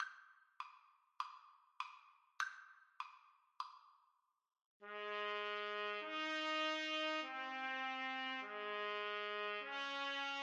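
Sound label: la marseillaise trumpet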